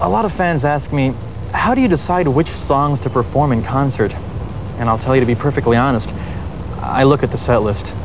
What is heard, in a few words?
concert